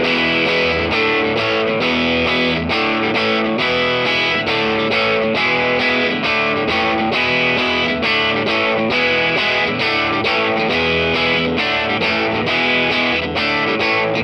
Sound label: Electric Chorus